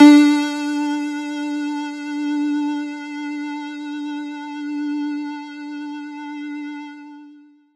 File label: Riddim Synth Hit D; One Shots; Rebirth; Ghosthack; Riddim Essentials 2020